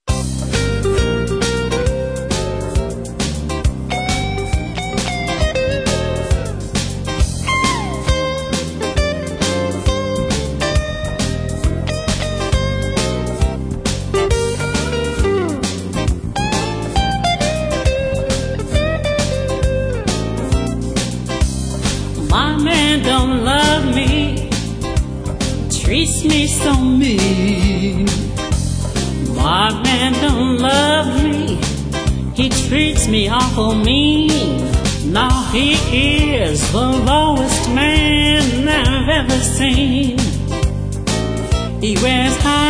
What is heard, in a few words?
Blues, Sultry Singer